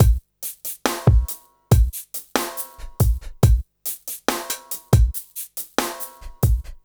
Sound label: Slomo Beat 140 BPM